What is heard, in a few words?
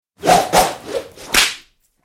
Whip whoosh and crack impact